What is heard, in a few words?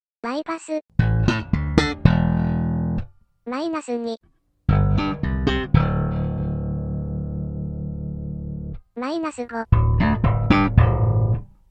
drop slap